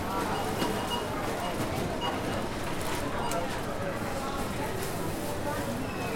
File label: supermarket; Store; Turkey Cooking Simulator